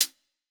hihat

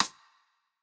rimshot; Pop; j city pop 1980

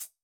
Hihat; Reference beat bpm95; urban grooves; Rock

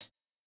Jrock hihat

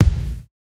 kick, lofi hiphop; Legendary lofi hiphop

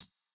hihat; Reference Beat BPM120; j city pop 1980

